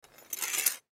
Metal Thin Rod Pole Pick Up Cement; Metal (Sound Effects:Materials); Foley; Blastwave

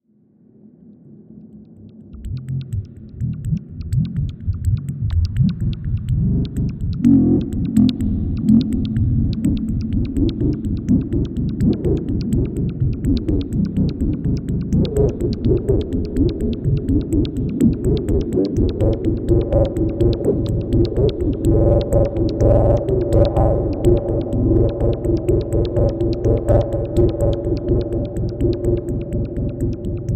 Liquid Bass Synth Ambience Weird; Sci Fi

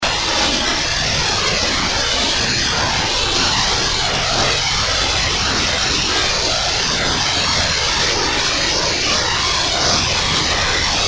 Sci-Fi Noise Stream Loop Friendly; Science Fiction Sound Effects; Andrew Fleming

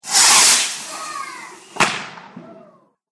Fireworks Whoosh Crackle With Yell; Firework Sound Effect; Celebration, Party, Holidays

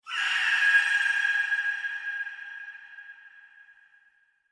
Scream Flashback Vocal Flash Scary Haunted; Horror Transition; Evil Voice; Evil Voices; BBFX

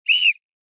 Sports Referee Whistle Blow; Whistle Sound Effects; Whistles (Sound Effects:Objects)

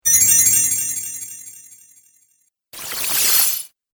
Magic Spells; Magic Sound Effects

